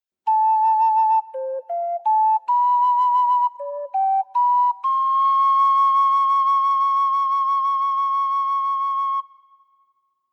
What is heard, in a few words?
Ocarina Get